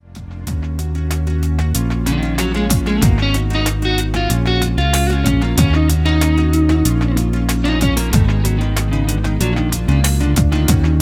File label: Guitar